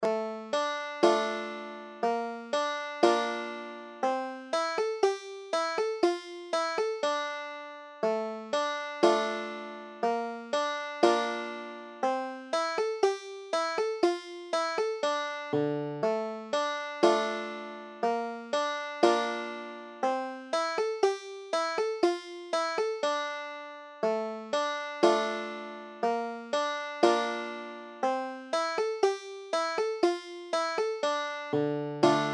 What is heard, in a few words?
banjo